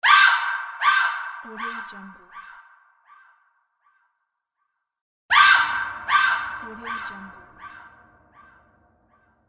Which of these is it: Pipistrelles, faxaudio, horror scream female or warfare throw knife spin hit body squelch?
horror scream female